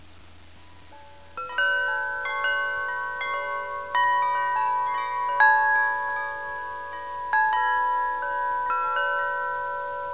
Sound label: chime